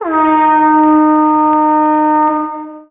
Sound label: Air Horn